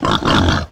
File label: Enter Play